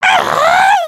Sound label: failed build; emotes